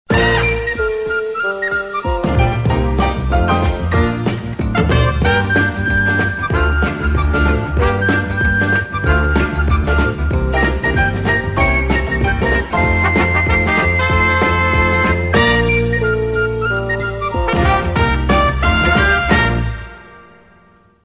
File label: Ringtone